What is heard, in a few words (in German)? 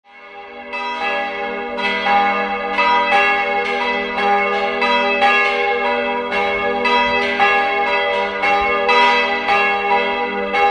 Biberbach, glocken